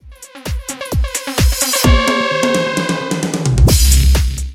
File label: reverse percussion reverse kick sample